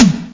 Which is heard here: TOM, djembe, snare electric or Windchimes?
snare electric